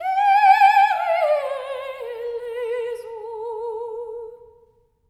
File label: SOP. TRAD, Symphony of Voices; Spectrasonics